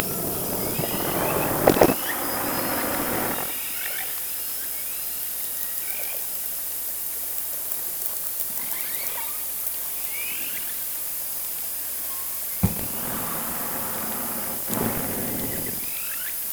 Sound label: Orca sound Night Passby